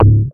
Super Click And Buzz

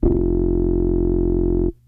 Tape Bass F3

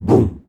Cyberboom

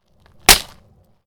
Snapping Wooden Fence

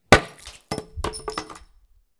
Piece of wood

